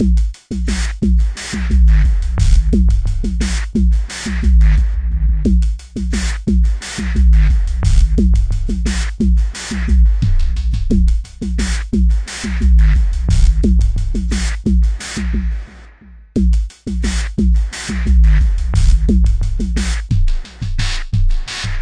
088 bpm sinewave echo groover, drum loops and breaks